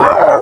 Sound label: speed hit